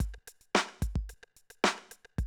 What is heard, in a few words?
drum loop, 100 BPM